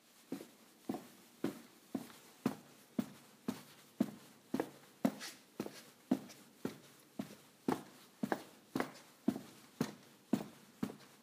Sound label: Walking On A Concrete Floor